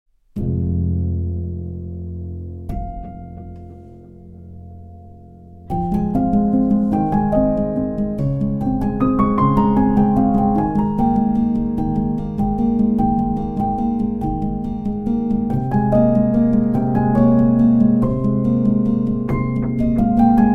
Solo Piano Version

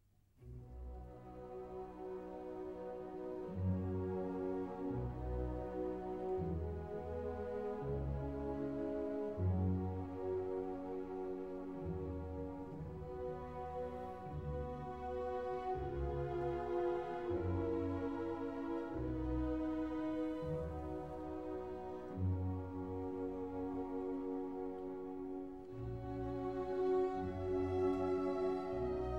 Piano Concerto No. 5 in E-Fla; City of London Sinfonia

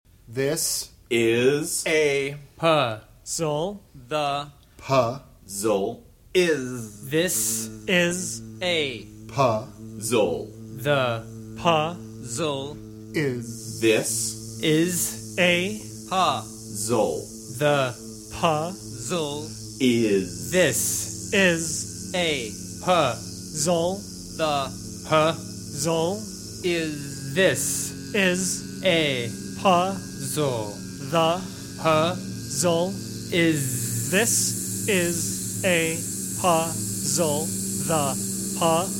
Percussion

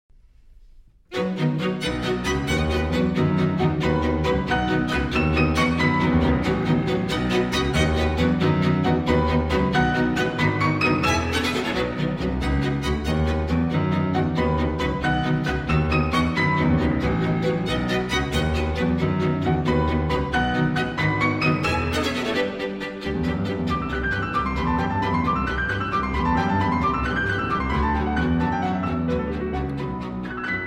Piano Quintet Scherzo. Allegretto; Op. 57

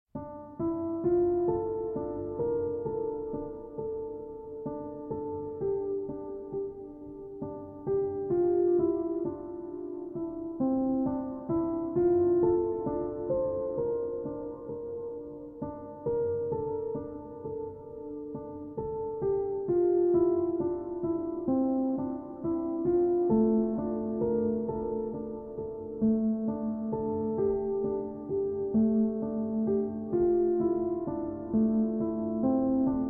Piano

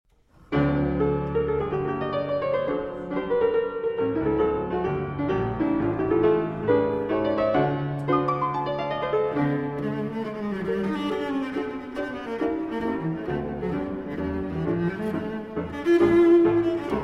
Cello Sonata in G Minor, Finale Allegro

